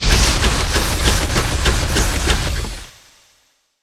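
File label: Weapon incinerator fire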